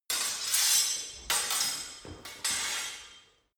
sword parry sword

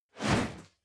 whoosh classic

